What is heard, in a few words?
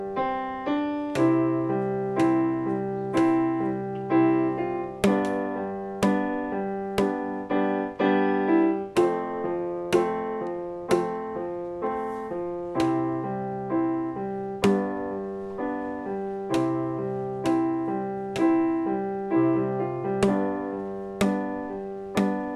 Boomwhackers